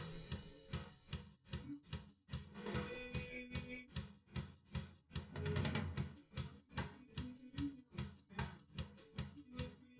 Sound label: drums live rec 250ms 1m; SiSEC11; underdetermined